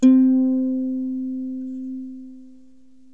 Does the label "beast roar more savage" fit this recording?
No